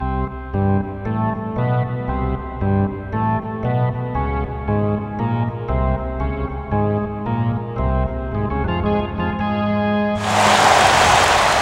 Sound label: Ditty